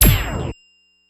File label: Knifekill